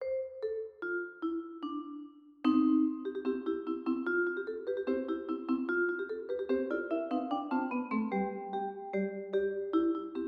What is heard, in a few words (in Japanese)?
おもちゃの兵隊の行進（ビブラフォンver.）